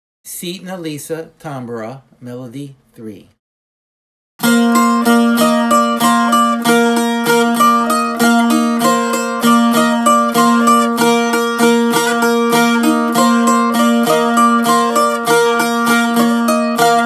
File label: tambura